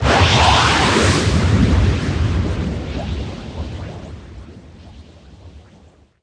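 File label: combat explode tie interceptor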